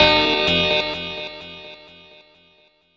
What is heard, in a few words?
GTR, Hip-Hop & R&B; USB Soundscan vol.47; AKAI